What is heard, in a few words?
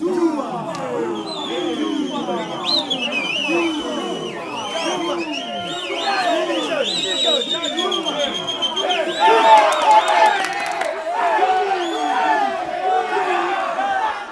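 African cheer; Spectrasonics; Heart of Africa 1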